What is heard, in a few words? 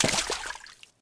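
water splash from paddle work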